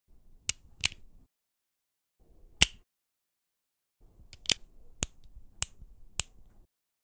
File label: Padlock Variations